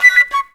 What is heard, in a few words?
D.FLUTE; E-Lab; X Static Goldmine 2; akai